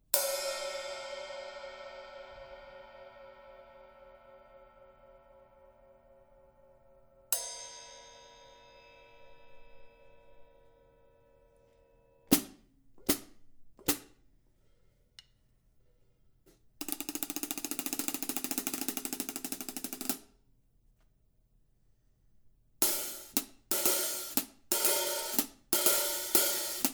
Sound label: K Sweet HiHats, cymbal